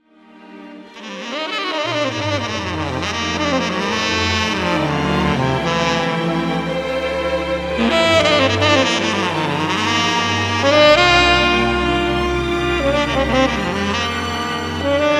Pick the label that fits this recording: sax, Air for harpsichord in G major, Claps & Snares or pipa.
sax